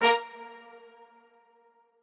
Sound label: strings